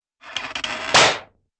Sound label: wooden squeak and pop